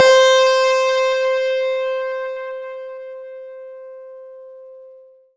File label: M-Audio Venom Glass Harp C5